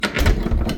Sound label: door, keydoor; environment